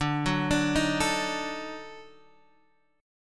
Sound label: D sharp Minor Major 7th Flat 5th D